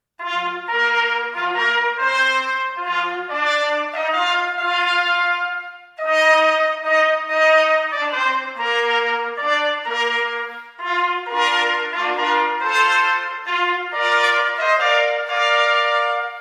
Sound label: ringtone